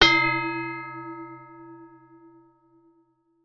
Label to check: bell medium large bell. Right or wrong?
Right